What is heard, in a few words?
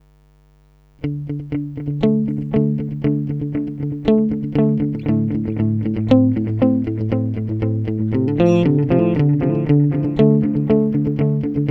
delay